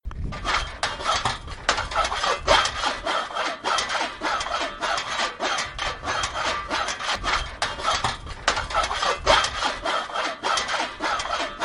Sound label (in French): Barre de fer